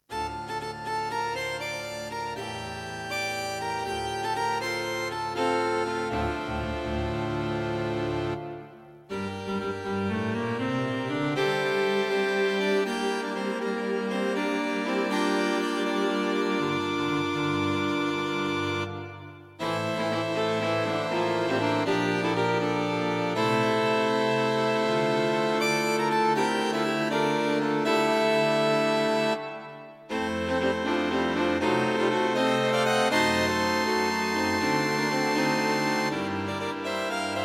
String Octet; String Octet (Moderato); String Octet for Concert performance

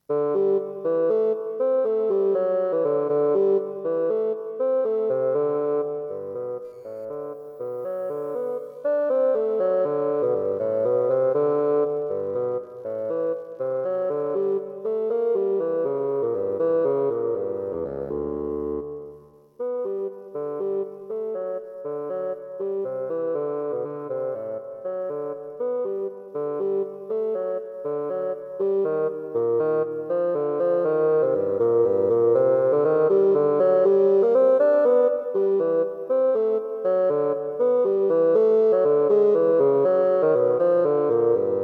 Intermediate Bassoon Solos; Each piece has a different time signature and passes through many keys.; Not too difficult.

